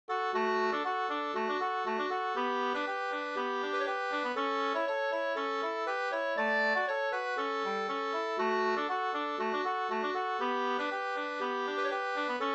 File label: polka